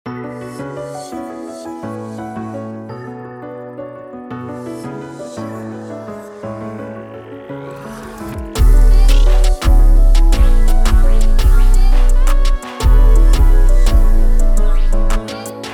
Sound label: Type Beat KEYS, 135; A Major; Genres: Jerk, Hoodtrap